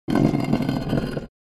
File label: Battle axe scrapes against stone